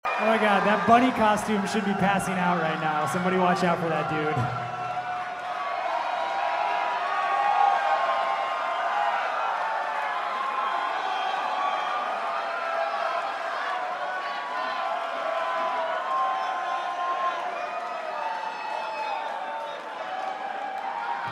Crowd Banter

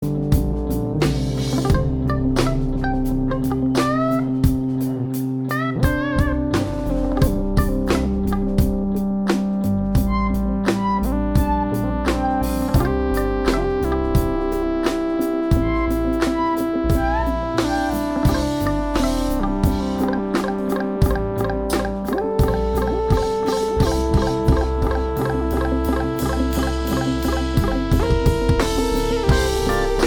Moog Jam

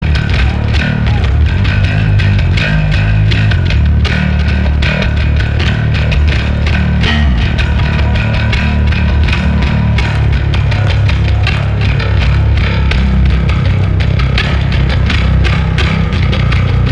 Bass Solo

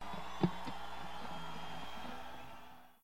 Bass Doodle

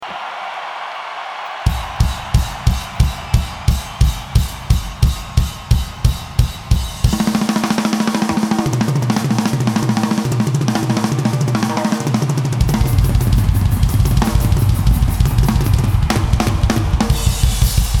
Drum Solo

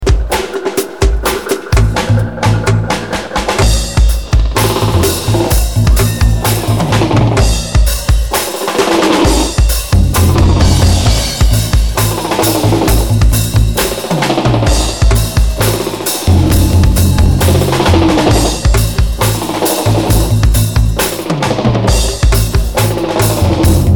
Drum Dub

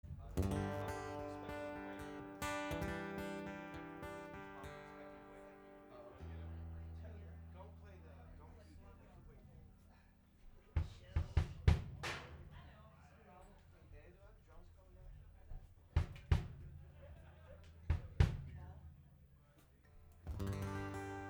tuning

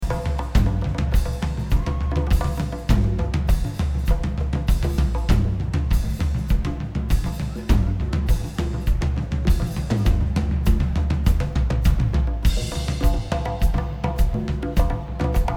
Drums

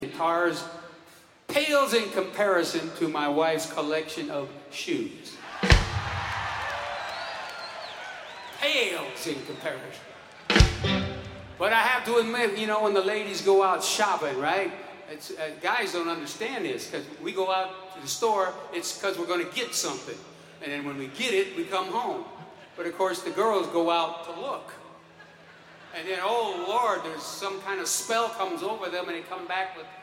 Guitar Talk

